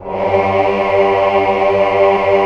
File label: SYM CHOR G 2, Korg; Back In Time Records; Korg Universe VOL-1; Korg SYMPHONY2